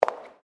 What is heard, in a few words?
FOOTSTEPS WOMENS HIGH HEELS CONCRETE STEP